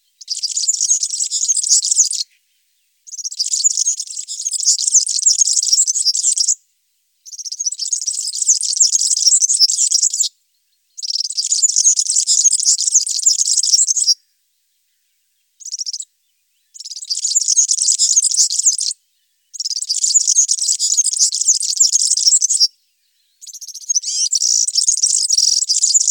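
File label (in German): Girlitz, serin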